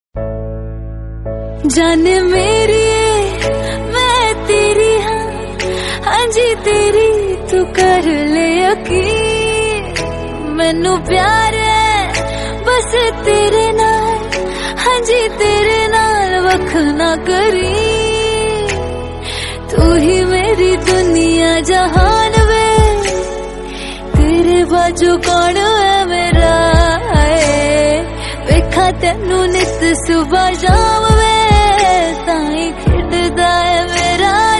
punjabi

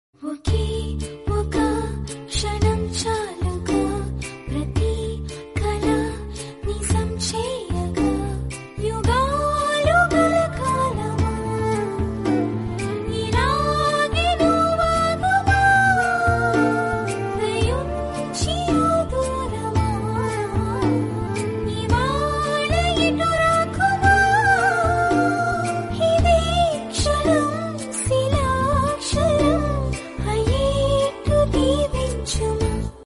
telugu song

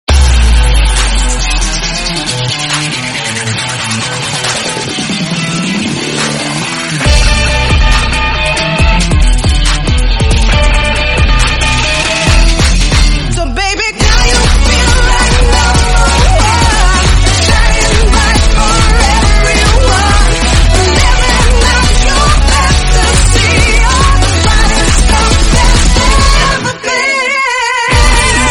theme animation; bleach tybw

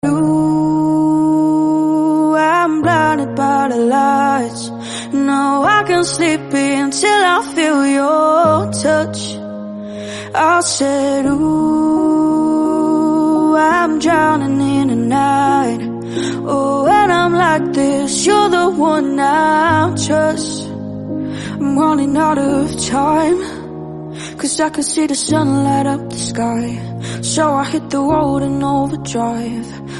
slowed reverb bgm

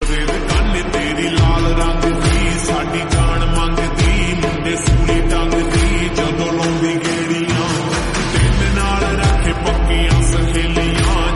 slowed reverb punjabi

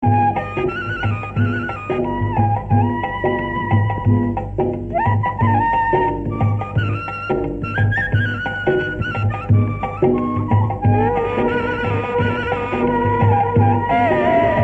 flute bgm; vikram

